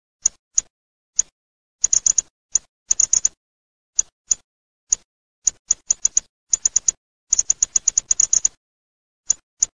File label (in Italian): FROSONE